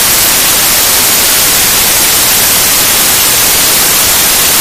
TV static

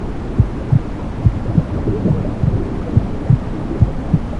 Womb

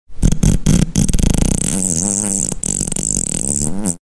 Silly Farts

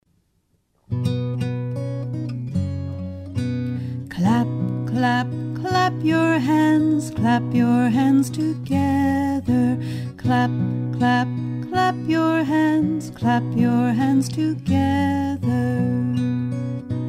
clap clap clap your hands

